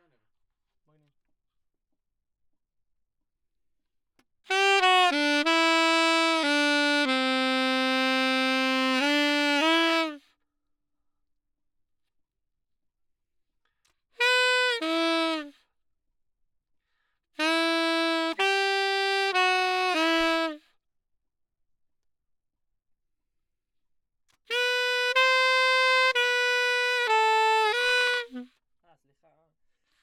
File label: Sax; Gefell